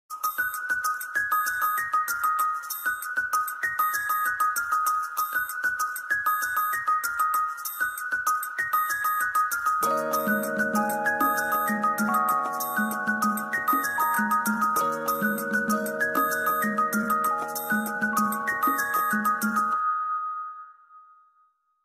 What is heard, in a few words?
Honor X5 Ringtone